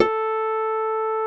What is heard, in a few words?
A3, SYNTH PLUCK; Music Store - Claudius Diemeter Schwerlos